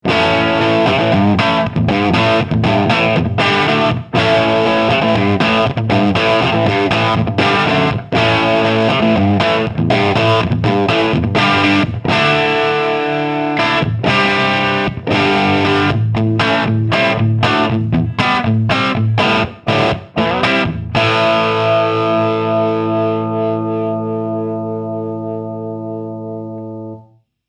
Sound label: Bridge PU 73 Marshall Superlead Marshall 412 260 Watt Shure SM57